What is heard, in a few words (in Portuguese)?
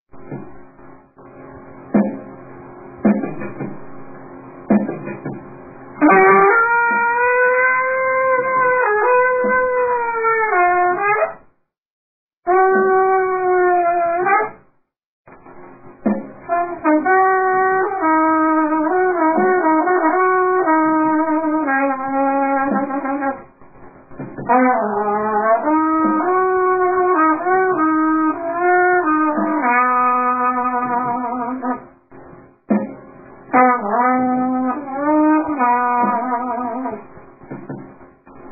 clarinete acompanhamento